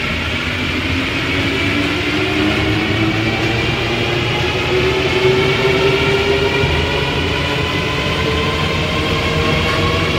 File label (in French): acceleration, Metro